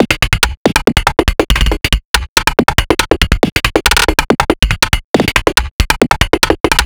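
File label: rhythm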